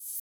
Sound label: open hat; FL Studio; xander brown drumkit